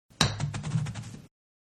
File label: Golf Putt fast moving; Sports; Manhattan Production Music